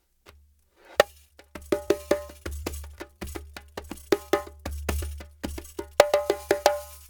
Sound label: Djembe with Kessing, African